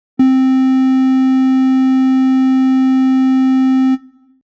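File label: Triangle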